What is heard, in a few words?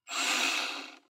drill rev; SoundsCrate